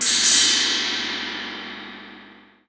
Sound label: gong lose; lego ninjago rise of the snakes